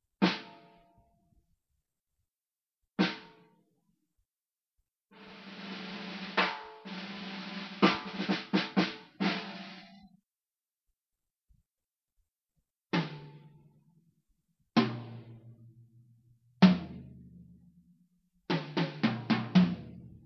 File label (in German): Schlagzeug